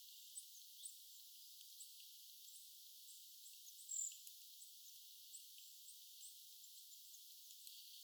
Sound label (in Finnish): ihan kuin pahkinanakkeli siella luontopolun ruovikkolahden vieressa lahella ruokintaa yksi